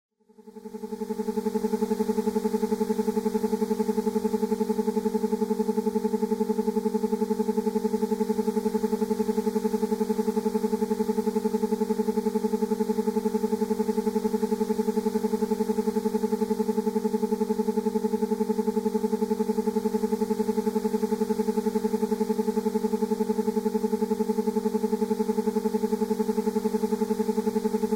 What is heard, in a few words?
alpha harmonic